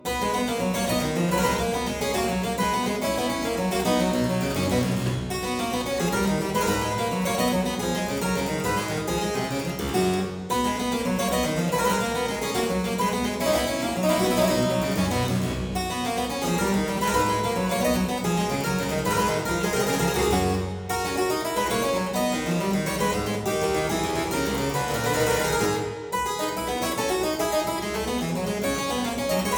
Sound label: Aria in C major Variatio 4, Harpsichord; Buxtehude; Complete Harpsichord Music